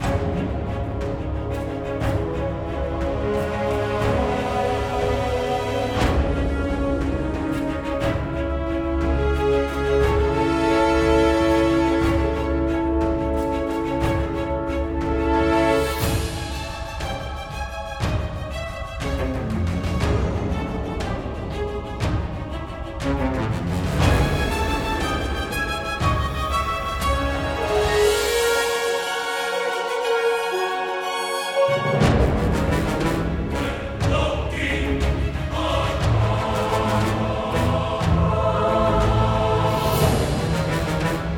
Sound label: music boss loop; pale king